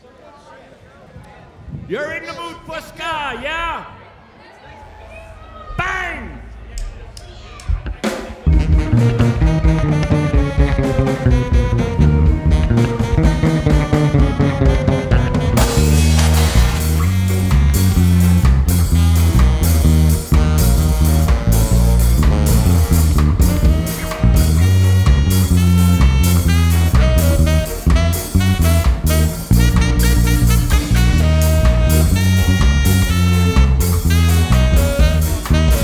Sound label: Live Broadcast Mix; Live at Froggy Daze; WKZE